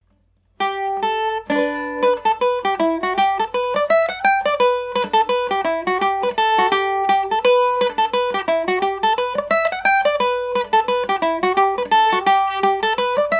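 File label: E dorian md304, Irish mandolin; morning star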